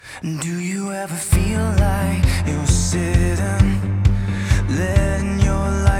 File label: prechorus intro